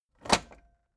tape deck close heavy

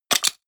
medieval click medium positive

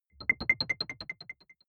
button echo distorted arpeggio

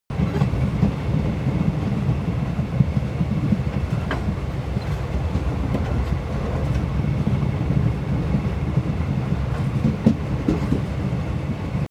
train locomotive drive medium loop

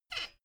folding chair squeak short

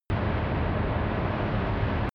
drone spaceship large

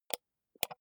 computer mouse wheel click slow

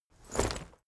leather armor movement

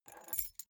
key ring small grab table soft

